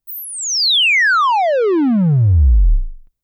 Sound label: fall; 落下音・はねる音